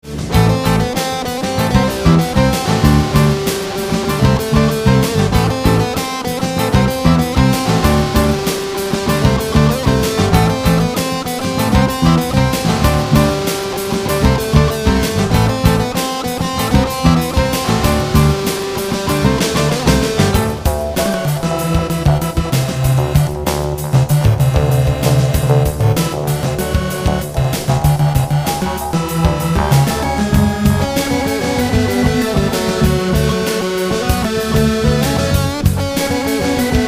Rock Drombulje

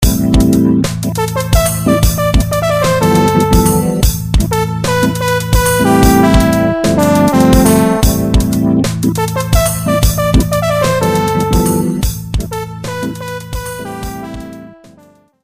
Scene Transition

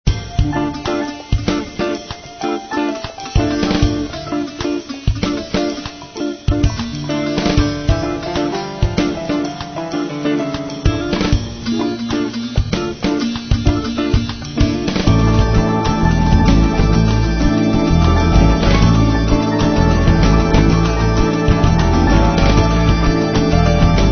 The Grooves, instrumental; LoFi

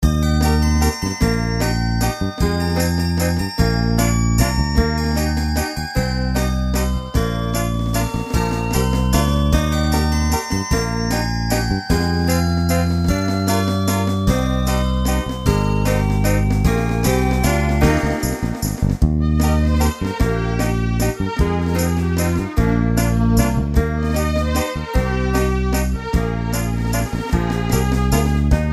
Christmas Song

